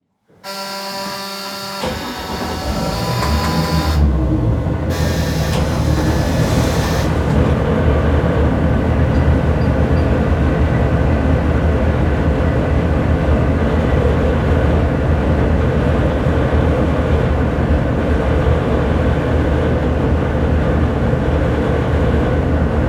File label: Patrol Boat Start Idle Off At Dock Interior; transportation